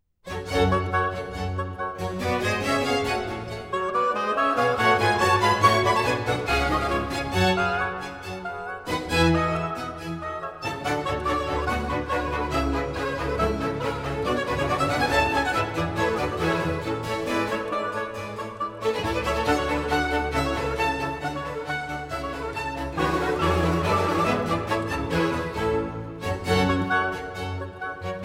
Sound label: Orchestral Suite In G Minor; Fasch; 3. Jardiniers